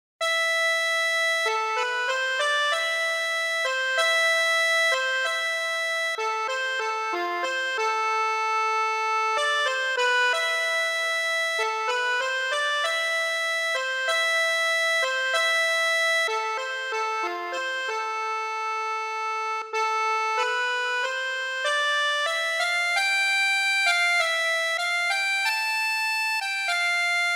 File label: accordion